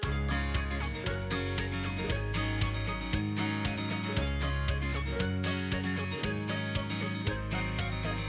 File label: music highscore initials; My Little Pony